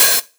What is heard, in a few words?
Cymbals HH Open; Vengeance Samples